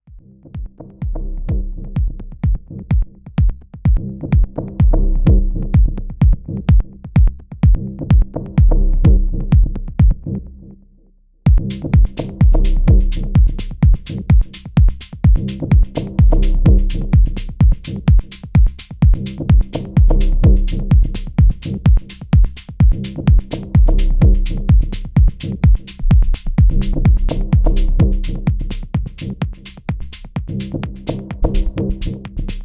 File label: Melodic Techno